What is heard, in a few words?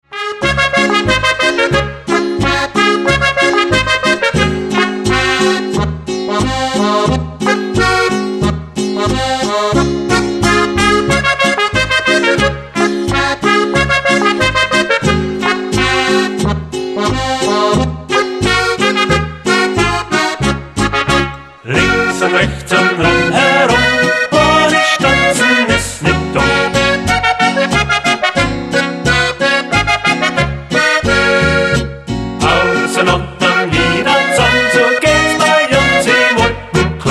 Boarischer